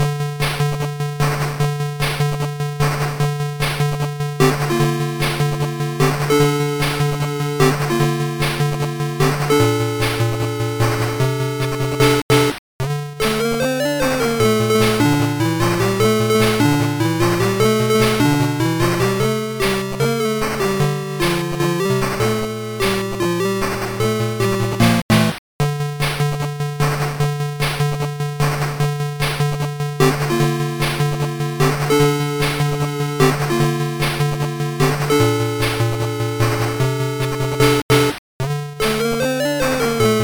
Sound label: Fight; Rocky; Master System